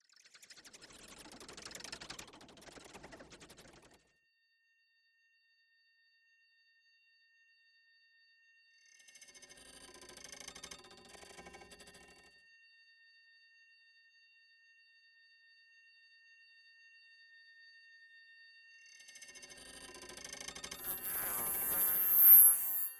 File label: Background; electroacoustic